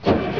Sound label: starter start; rigs of rods